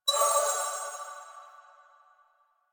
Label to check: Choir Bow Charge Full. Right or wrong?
Right